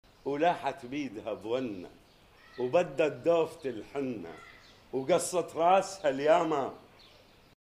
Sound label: loop